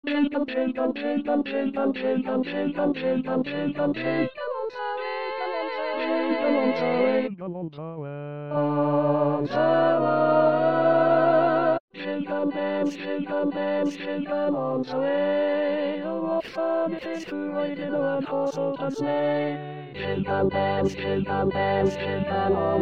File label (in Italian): Jingle Bells 6 voci